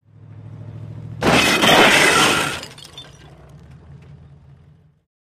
Drop Impact Moving Vehicle Collides With Stationary Vehicle, Centered, Great Breaking Glass Sounds